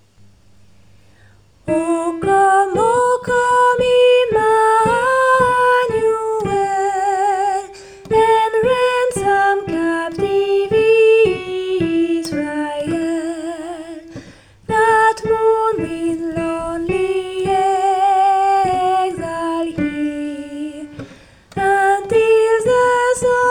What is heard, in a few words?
o come emmanuel soprano, Christmas Choir